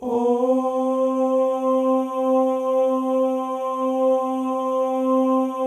male ohs; Spectrasonics; Symphony of Voices

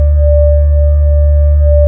B-3, Hollow; Keyboards of The 60's and 70's

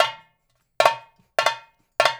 FILL, Percussion; FILLS; InVision Interactive; Percussion Slam

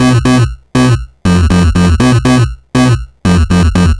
Sh09 Bass Loop, 120BPM

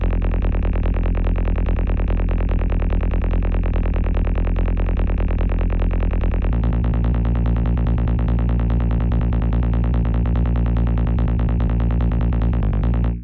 bass, 145 C min, Hardcore Breakbeat; Big Fish Audio; Hardcore Breakbeat Action; Decimater

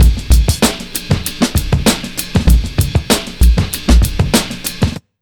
97 BEAT, Underground Hip Hop

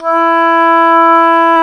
oboe; Roland LCDP04 Orchestral Winds; Oboe Brth 1

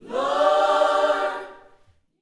Vocal, Gospel, PHRASE; Spectrasonics

